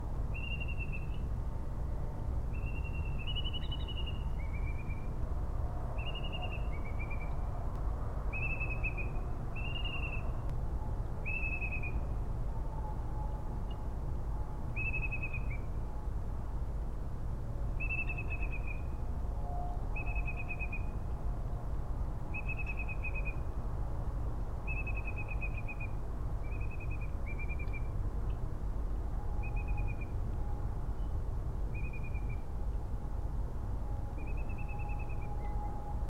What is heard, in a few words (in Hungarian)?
kis poling hangja